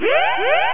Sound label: Alert; freedroid